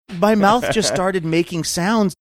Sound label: my mouth just started making sounds